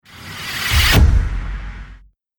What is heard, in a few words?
Drum Impact Movie Trailer; Sonic Logo Sound Effects